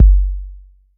BD 808

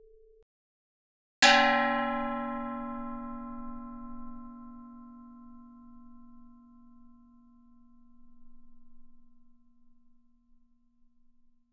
Gamelan; Propeller Island - Complete Gamelan

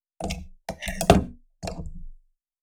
potential fill thing

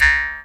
D JAW, Primitive Instruments

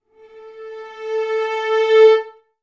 VA CRSC A3, Advanced Orchestra; Best Service

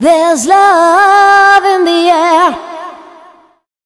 FEMALE, Dance Vocals; Best Service ProSamples vol.12; AKAI

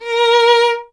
STR VLN, Vln Detache; Miroslav Vitous

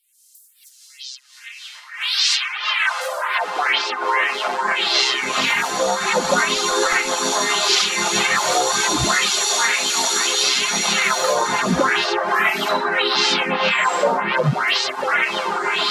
Late Night Atmosphere; AMG - Prototype Drum & Bass

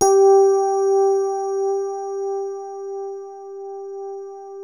DX Rhodes

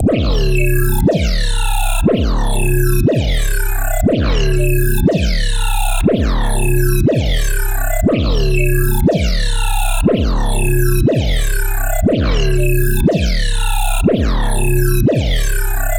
killer bass

